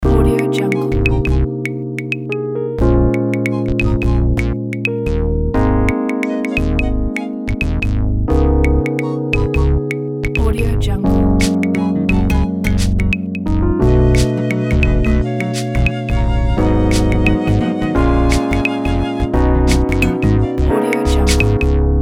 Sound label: neo soul